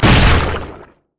crit attack; Zac

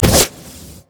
mana flare

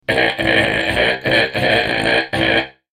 Steam Golem laugh

